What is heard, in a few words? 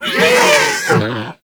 Snow Critter Vocal Death; Hunting Critters